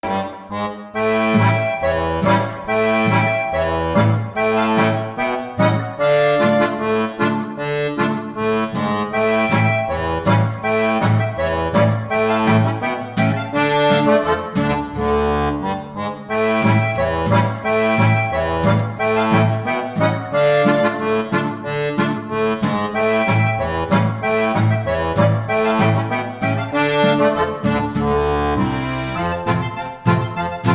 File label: Russian Polka Instrumental